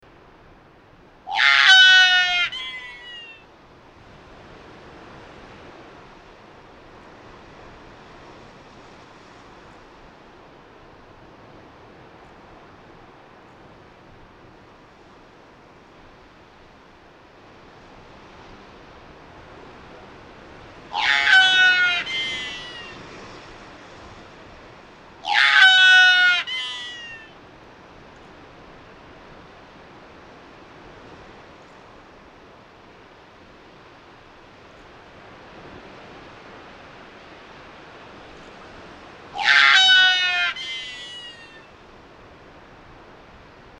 Ljusryggad albatross

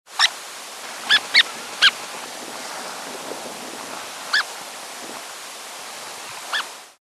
Prärielöpare

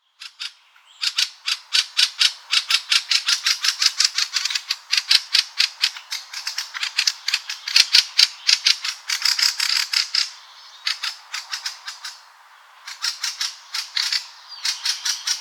Björktrast

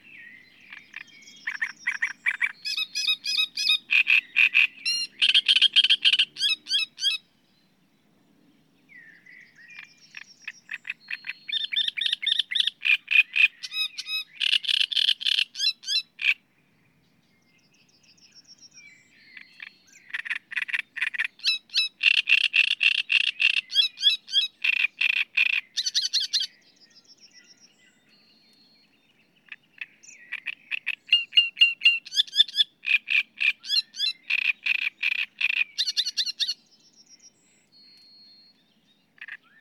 Trastsångare

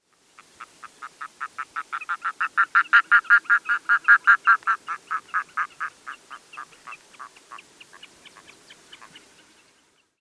Smålom

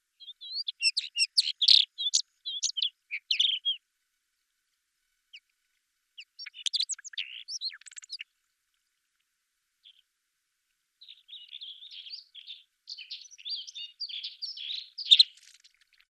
Alpjärnsparv

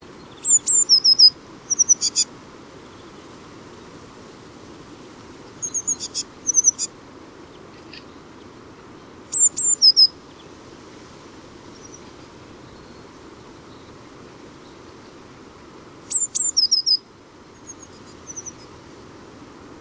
Blåmes

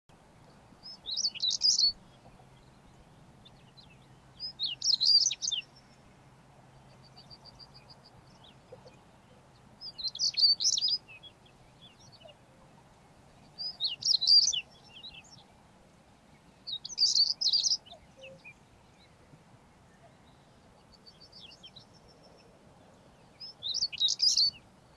Svarthakad busk

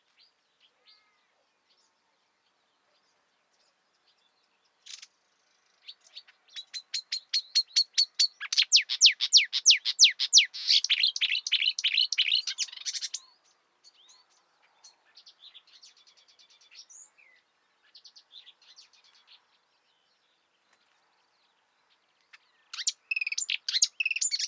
Kärrsångare

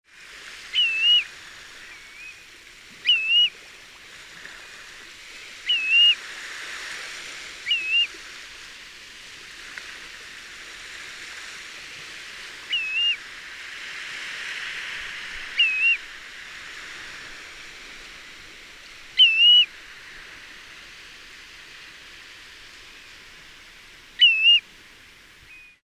Kustpipare

